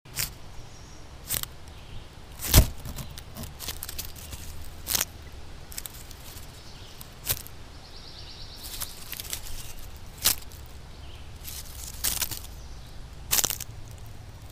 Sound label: Hi Hat